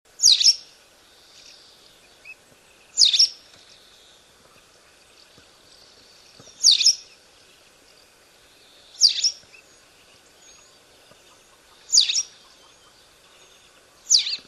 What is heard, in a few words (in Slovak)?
trasochvost, zvuky vtakov